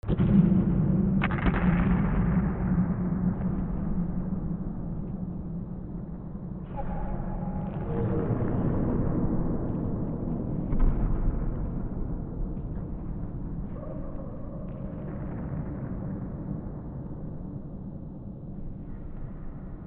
AMBIENCE

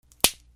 BREAKING

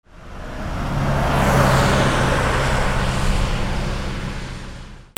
CARS

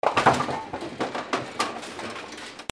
GAMES

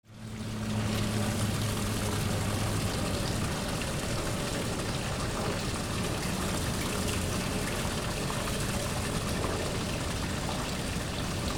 BOATS

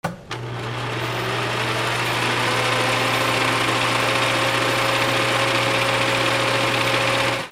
INDUSTRY

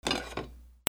KITCHEN

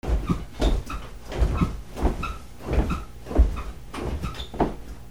HOUSE

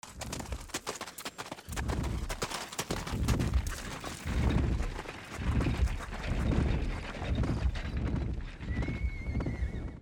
VEHICLES

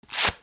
RIPPING

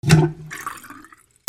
BATHROOM

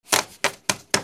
OFFICE

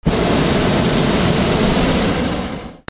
flight

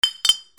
BANGS

